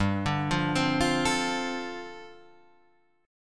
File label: G 7th Suspended 2nd Suspended 4th G, Guitar Standard; 3,5,3,5,6,5; arpeggiated; capo=3